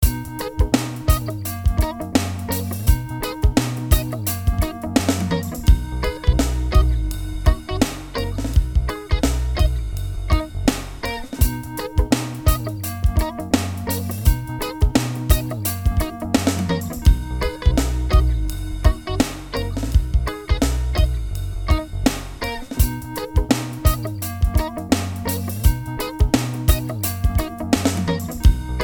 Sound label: Hop; real estate stock media vol 1